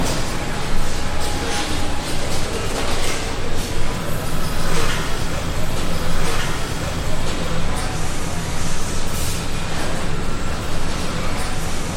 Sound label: Automated Factory; hiberworld android ios online windows gamerip 2019